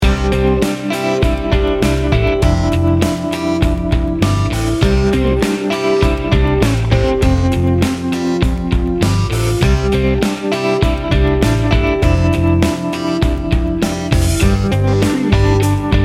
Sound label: remembering loop